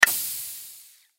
Whip Cream Can, Whip Cream Can Puncture Air Release; Whips; Sound Design; Foley; Blastwave